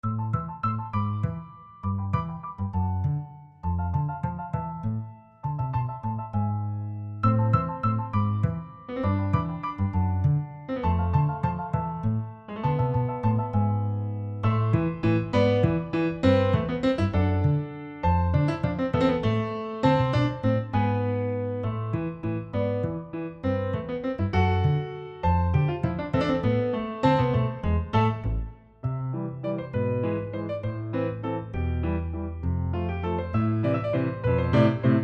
Acoustic Waltz

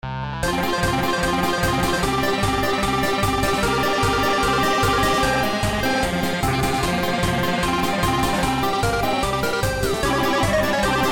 guitar

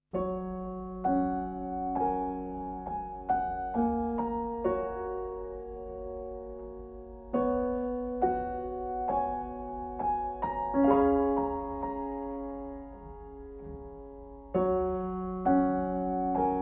piano

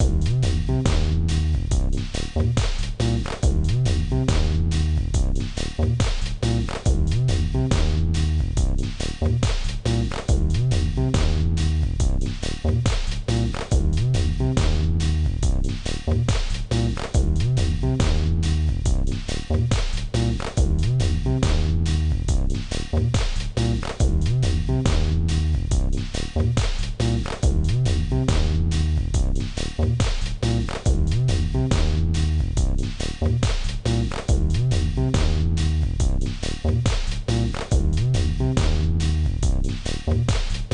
tb303 (slow); Project Yume 2kki; Yume Nikki

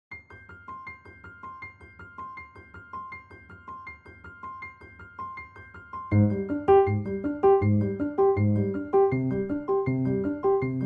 Piano Sketch; Celeste Classic 2

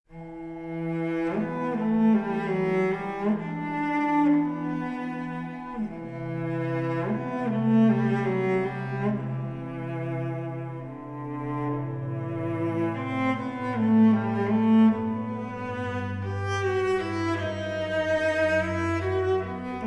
strings quartet

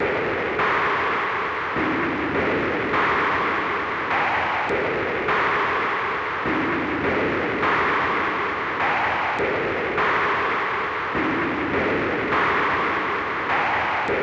bgm farm; Project Yume 2kki; Yume Nikki

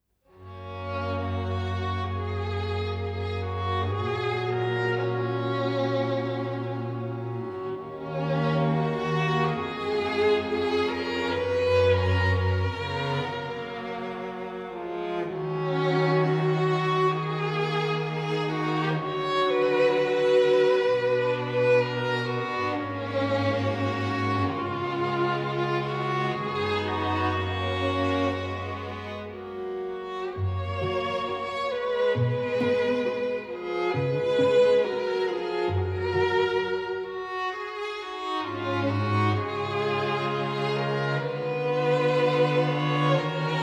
strings quartet

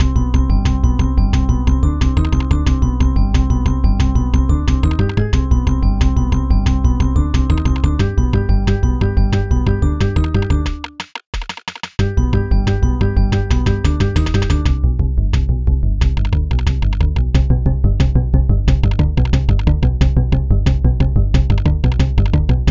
guitar

